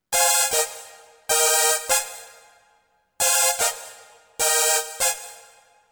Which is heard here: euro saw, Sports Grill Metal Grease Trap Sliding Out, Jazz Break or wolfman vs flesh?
euro saw